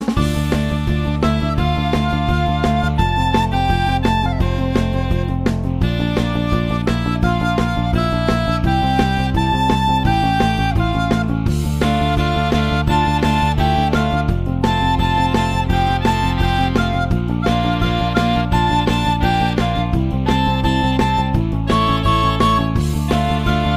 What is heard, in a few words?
Sax; Gravity Falls